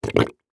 mud fart bubble; a dump in the dark

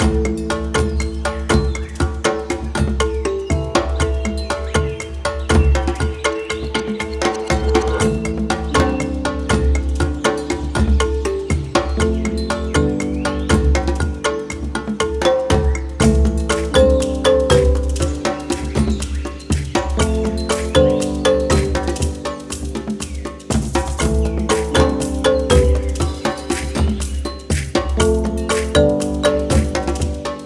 Jungle Gameplay Loop

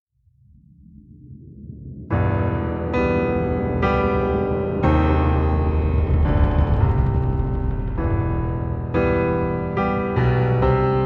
Theme (Piano Version); battlefield bad company; Leggionaires

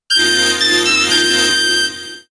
Grass Whistle part 1; pokemon sfx gen 7 attack moves sumo usum

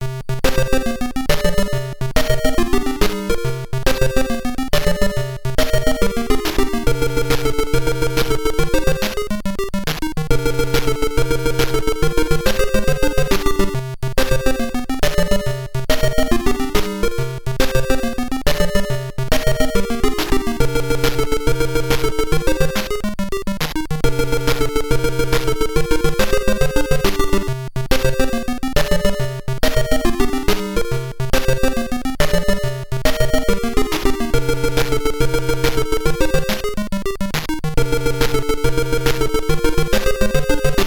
(PSG Version); Hydefos

